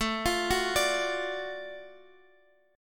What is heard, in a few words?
Gb Minor Major 7th Sharp 5th, chord